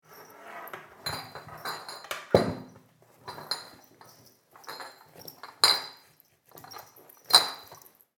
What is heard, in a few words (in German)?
Kueche Flaschen